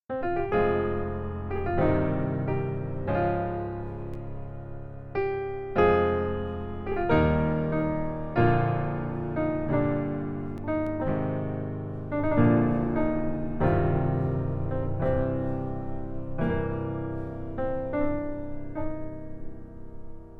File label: epic